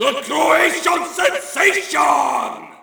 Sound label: Shout, audience; UFC Tapout 2